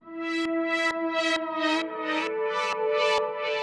132bpm Lines n Loops Synths, uk garage samples; Solipsa

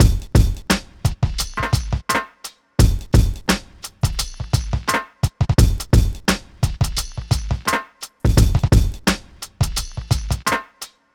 Drums, Hip Hop

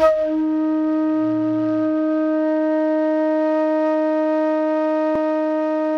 FLUTE FF D#4; Greytsounds; Philip Wolfe David Crozier's Saxophones

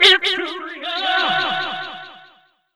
HIT TO 1, Funky Vocals, ADLIB MEN; USB Soundscan vol.18; AKAI

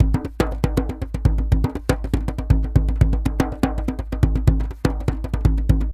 DJEMBE GROOV; Best Service; World Colours

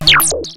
REZO KIT; Rubber Chicken Software Sample Bank

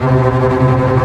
TREM BASS C3; E-MU Formula 4000 Series Vol. 5; Protozoa; Proteus2 Instrmt

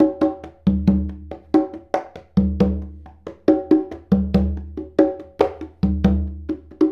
Congas; Essential Percussion

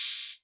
808 Hat O; Drums

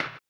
HAMBONE, Vocal Blues Soul; Spectrasonics; Planet

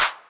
JV KIT; Pro-Rec - Synthsonic; JV ANALOG2

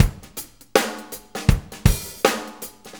Shuffle Loop, Full Kit Loops

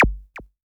bleep, Korg ER-1; Kit 9

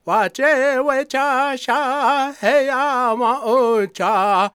C# MIN, World Voices, LAKOTA

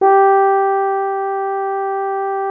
BRASS 2 G3, ORCH BRASS; Greytsounds VOL-1

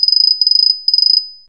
RINGLE; Zero-G Ambient 1

